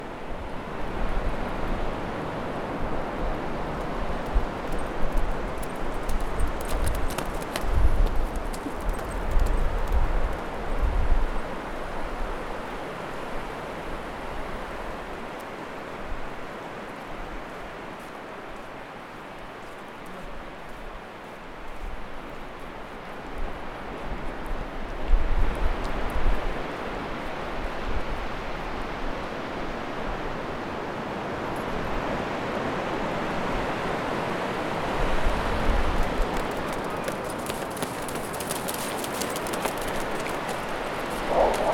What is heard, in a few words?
Windy beach Dog playing